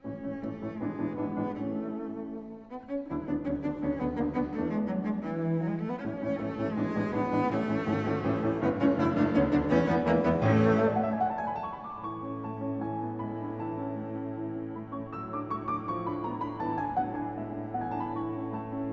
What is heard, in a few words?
Sonata for Cello and Piano No.3 in A, Allegro vivace